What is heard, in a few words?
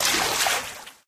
fishing bobber